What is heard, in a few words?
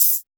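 open hi hat; Drums In Your FACE!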